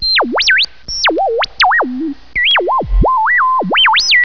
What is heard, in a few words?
radio